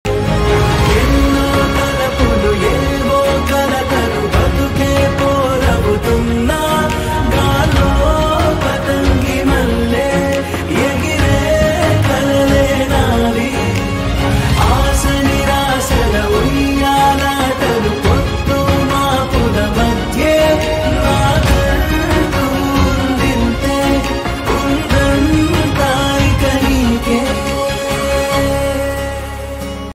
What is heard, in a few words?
tamil ringtone